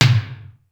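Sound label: extra low super tom, sr16 tom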